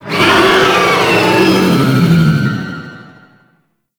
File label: Attack; Warframe; Tau; Sentient Dactolyst